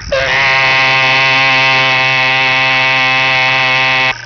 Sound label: sheep frog call; Texas; amphibian watch; texas nature trackers